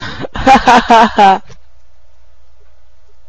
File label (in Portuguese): risonho mal humor